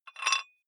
dumbbell thread take off small weight soft, fitnessstudio; sport freizeit